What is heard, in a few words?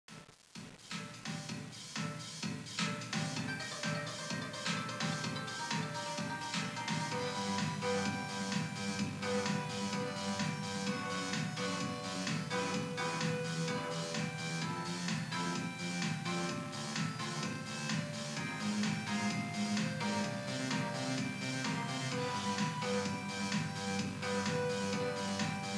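background music karaoke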